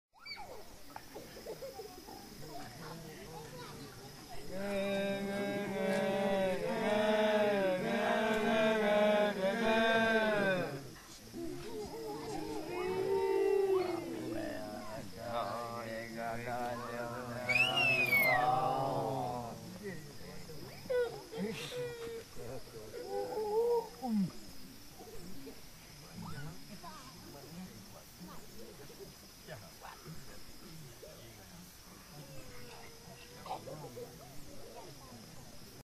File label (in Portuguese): canto do gaviao comprido dentro do kuxex